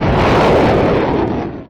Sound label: rocket; endless sky